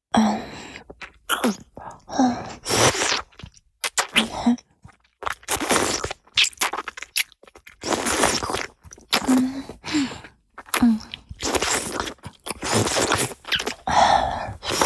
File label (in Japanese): 乳首舐め(中):陰茎をしごきながら乳首を強く舐める (しこしこ無し), チュパ音, ASMR; チュパ音抜粋集